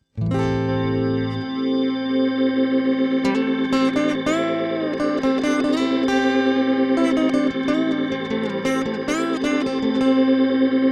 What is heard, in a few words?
Superego plus Rotary Latch